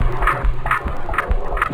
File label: percussion loops, Techno; PS-45 WAV Techno ID